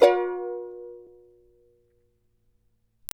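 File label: charango C minor up; Spectrasonics; Hans Zimmer Guitars VOL-2; CHARANGO CHD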